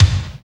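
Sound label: KICK, left; East West - Phat & Phunky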